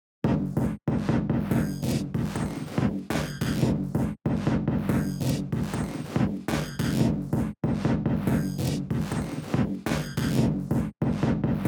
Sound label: distortion to digital